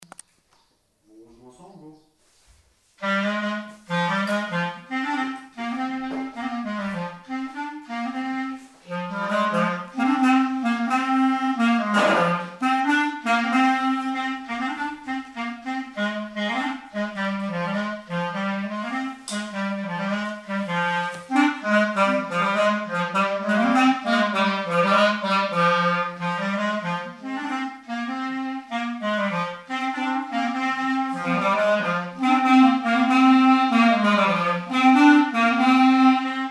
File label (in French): jeu riqueniee, clarinette